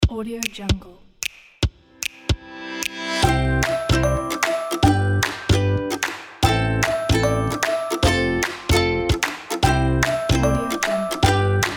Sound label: funny comedy music; plaincask